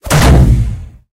gui bns fail, tanks

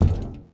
glass walk

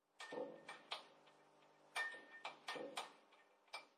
ambient port; World Of Warships; port3d

